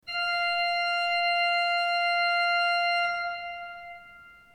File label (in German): ton, orgel